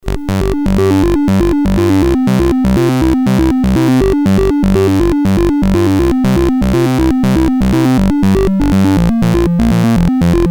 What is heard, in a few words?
BASIC sid CSG8580R5, GAMES; Tether Terrain